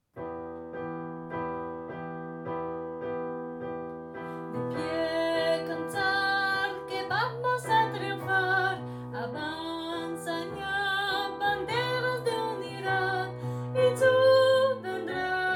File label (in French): Soprani; pupitre soprani